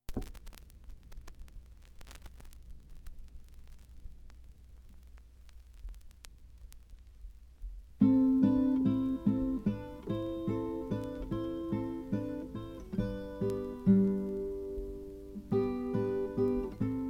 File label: Theme (Instrumental)